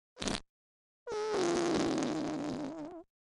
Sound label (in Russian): podushka perdushka